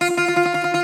GR. BAZ, Plucked Strings, Greek Bazouki; E-MU Producer Series Vol. 7 – Old World Instruments

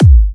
G, Kicks; markus hakala

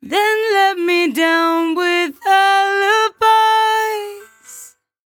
57bpm Am, Velvet Vocal; Zero-G

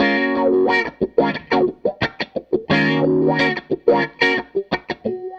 Strat Guitar Loop

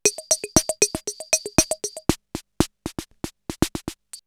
CR78 R77 BeatBox, drumloops, DrumMachines

